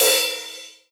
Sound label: crash, drums; piper; legacy engine